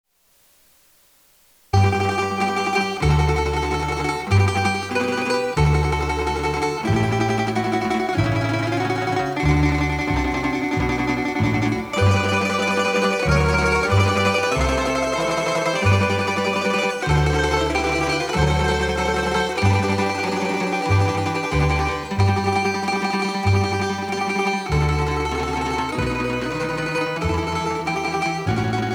Croatian Christmas Medley

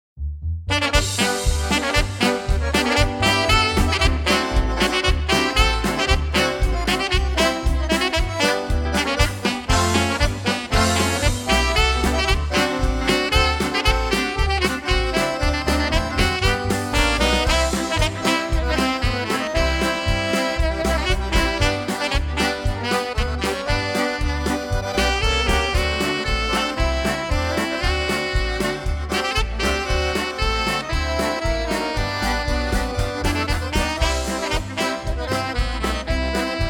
Christmas Polka

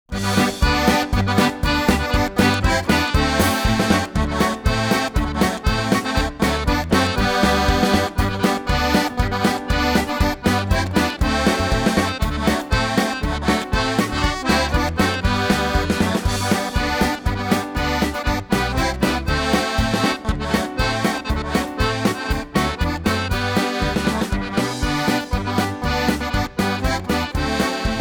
Polka

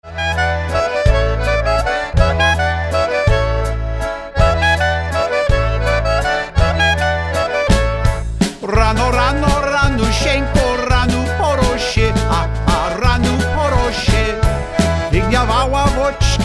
Oberek

